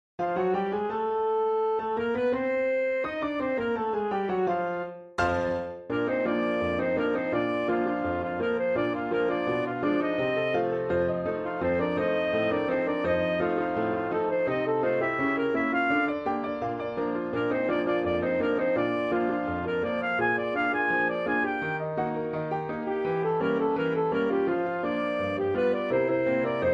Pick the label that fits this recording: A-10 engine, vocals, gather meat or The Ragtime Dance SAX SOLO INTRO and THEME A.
The Ragtime Dance SAX SOLO INTRO and THEME A